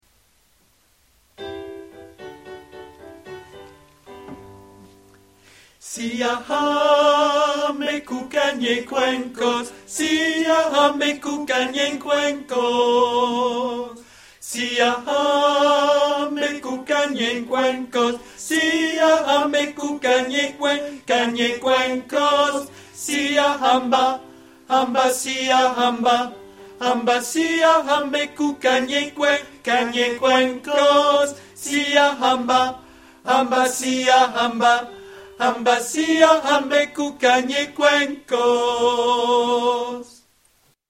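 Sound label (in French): siyahamba ténor voix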